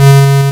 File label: Hard PCM Chip; chiptune